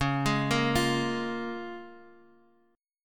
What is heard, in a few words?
C sharp 6th; down; chord shape